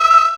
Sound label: Vocal Oneshots; Vengeance Samples; Vocals